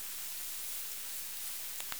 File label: Full Spectrum Myotis mystacinus, bats